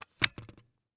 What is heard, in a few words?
Electronic CD Player Opening; RCA; AdobeCC